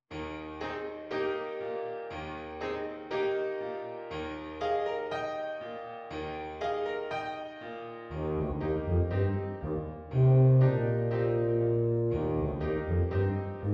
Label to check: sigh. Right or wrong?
Wrong